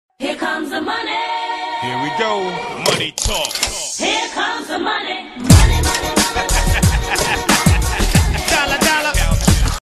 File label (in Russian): dengi prishli radost